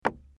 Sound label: koto